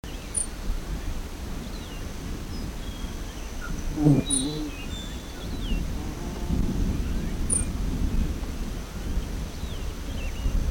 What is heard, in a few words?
Coryphaspiza melanotis